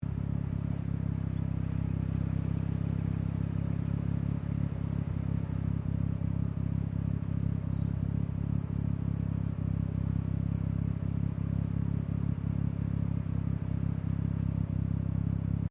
Car Mazda RX7 1983 series 3 exterior front idle loop; Cars; Vehicles